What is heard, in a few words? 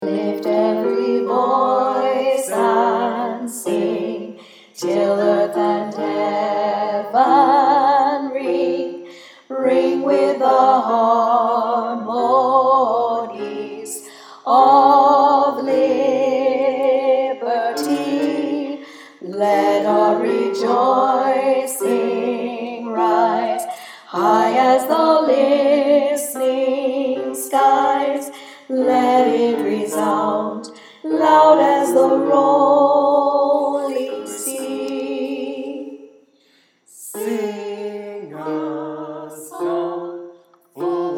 tenor lift every voice and sing